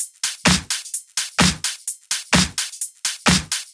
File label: Drumloops 128BPM